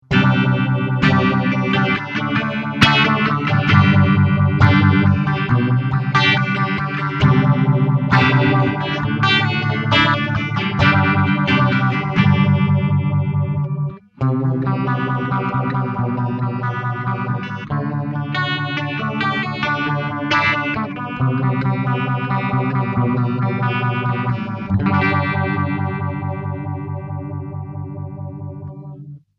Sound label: Phase 90 Fast